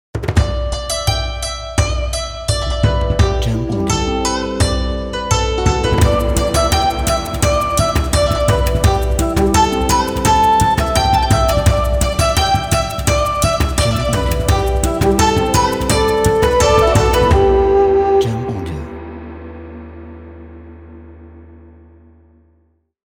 China Drums Opener

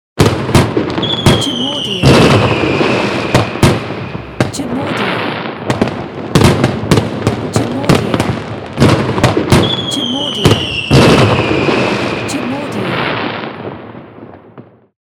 Explosion Fireworks

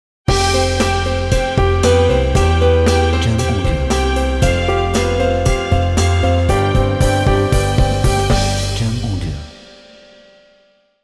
Corporate Inspiring Intro Logo

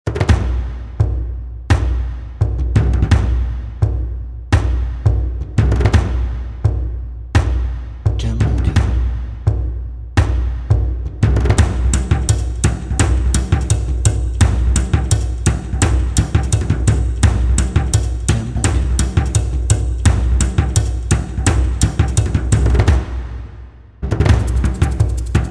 Ethnic Drums

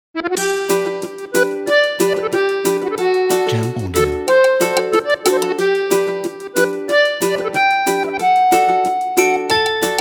Summer Kids

